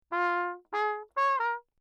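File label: trumpet mute ohne phrase; Perinétventiltrompete; Lechner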